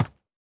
山羊脚步声

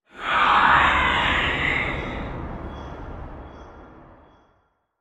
幽匿尖啸体尖啸声

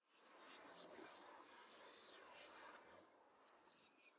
灵魂沙峡谷低语声; ambient

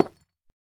深板岩放置声

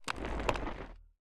待机声; 嘎枝

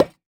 饰纹陶罐插入声

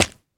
海龟在蛋上跳声

